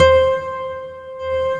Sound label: C5, SynthLine